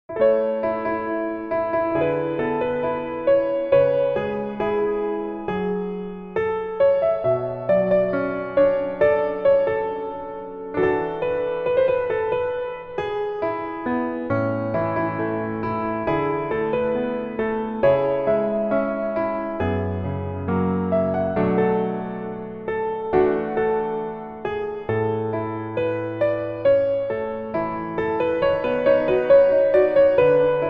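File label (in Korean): Piano version 멜로디MR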